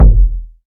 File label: small reverb bass drum one shot e key, Royality free bass drum sample tuned to the E